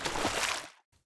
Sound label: water wade; player